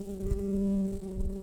Humilis workers, bumblebees

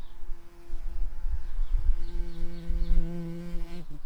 Pascuorum workers, bumblebees

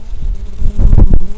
Lapidarius queens, bumblebees

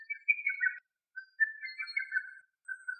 Lesser cuckoo

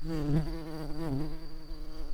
bumblebees, Pascuorum queens

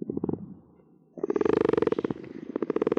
Common or Grass Frog

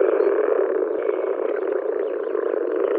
Moor Frog

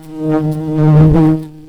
Lapidarius queens, bumblebees

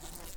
Lapidarius workers, bumblebees

